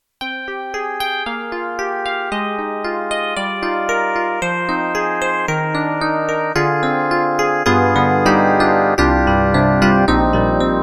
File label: Yamaha MU1000EX Tinker DX